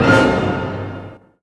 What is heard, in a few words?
CINE HIT, FILM TOOLS; Q Up Arts; Dream Experience